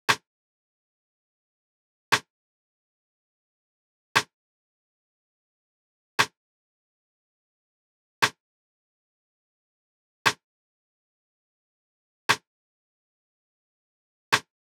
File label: 118 bpm F m Snare Melodic